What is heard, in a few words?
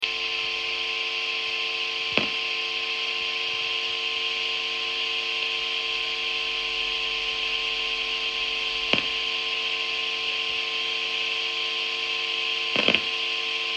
tube scream argh